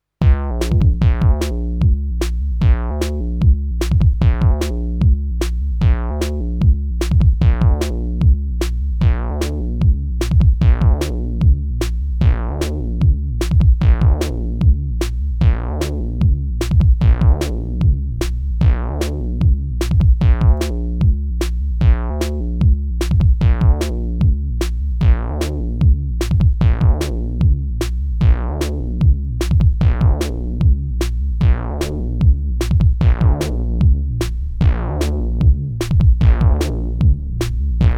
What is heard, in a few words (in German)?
Rechteck PWM dann 2 OSCs